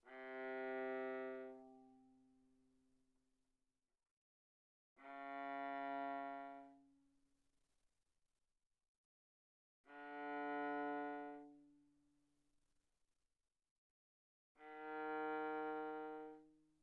Viola arco sul C pp C3 B3

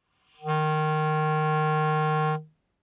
Bb Clarinet ff Eb3 stereo; Woodwinds

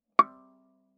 Viola pizz ff sul D Db6; MIS Pitches - 2014; Strings

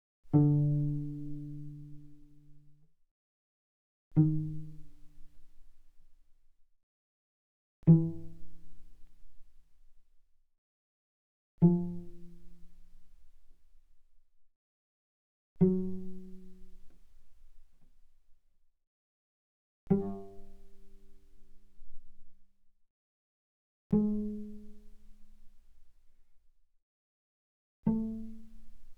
Cello pizz mf sul D D3 Db4; mono; Strings

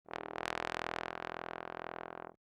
Bass Trombone ff E1; MIS Pitches - 2014; Brass

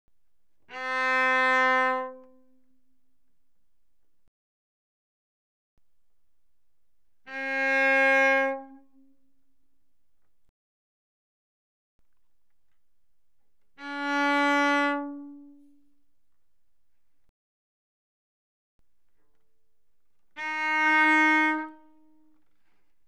Cello arco mf sul A C4C5; Strings

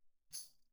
tambourine normal pp; Percussion

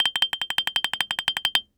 Xylophone hard rubber roll ff G7 stereo; MIS Pitches - 2014; Percussion

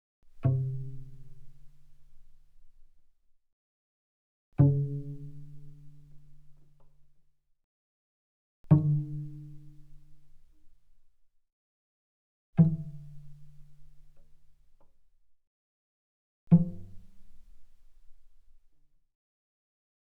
Cello pizz mf sul C C3 C4; Strings

